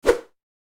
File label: whoosh; Ion Shift